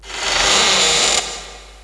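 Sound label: door open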